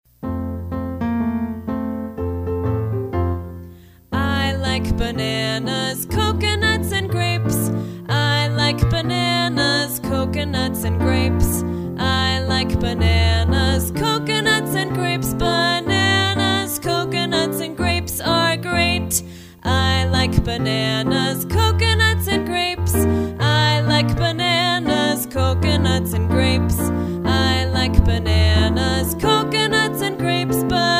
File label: vocal; PSE Music